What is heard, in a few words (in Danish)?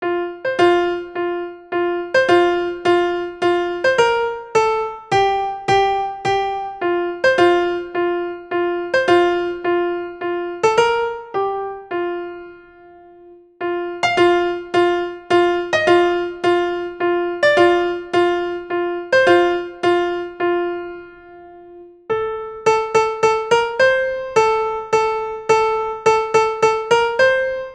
Allegro sopran